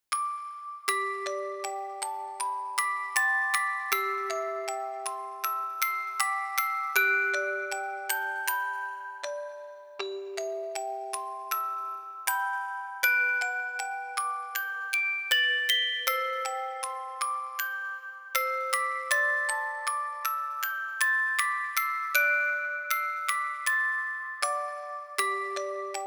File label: ver. music box; Pure×Connect